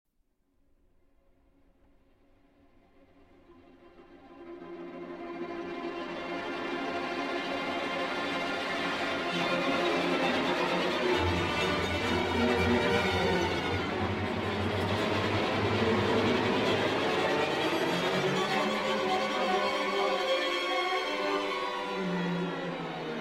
Symphony Orchestra; V. Furioso

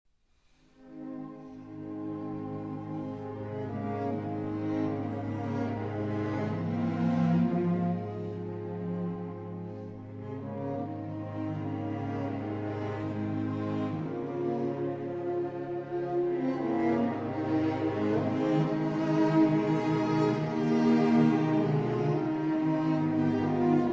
strings and harp; Notturno

